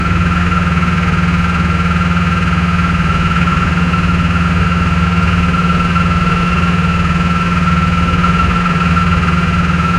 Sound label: close up recording of a v, diesel